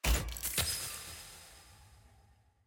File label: artifact click; jinx collection